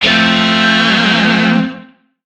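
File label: Shawzin Major Chord G; Music; DE; Warframe; Lizzie Shawzin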